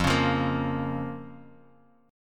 F Suspended 4th Sharp 5th F down Guitar Half Step Down